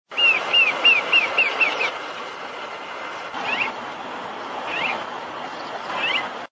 voz choca corona rojiza

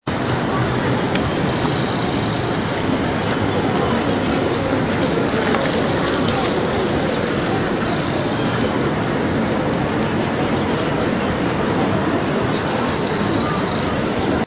voz piojito trinador

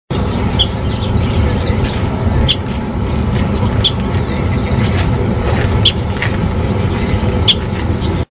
voz frutero negro

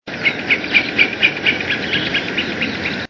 voz carpintero real

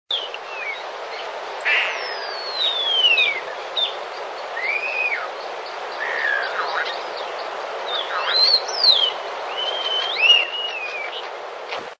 voz estornino pinto

